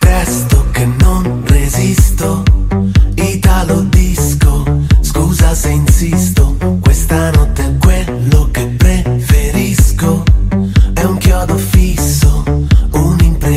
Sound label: italo disco